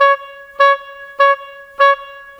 Oboe, Orchestral, Rock Pop

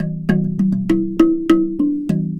Box Drum; Sonic Foundry (Sony Creative Software); Ma Ja Le Ethereal Textures; Worldly Rhythms

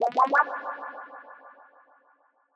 Random Pad

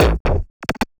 Subtractive Beat

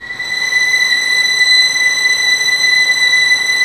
Vls6 mf; Roland L-CD702; Vlns 6 mf-f

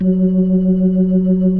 F#3F, B3, Vintage Keyboards

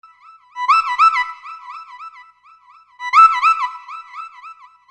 Keyboard

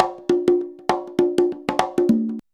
CONGA, Modern Percussion Loops; Soundscan vol.56; AKAI; CONGA 10G

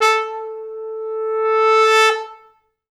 A3, Pop Brass; Best Service ProSamples vol.19; AKAI

